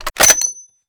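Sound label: sniper ads rechamber; gmod; iw8; alpha50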